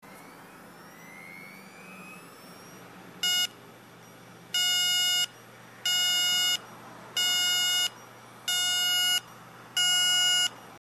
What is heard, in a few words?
Seagate heads, damaged hdd sounds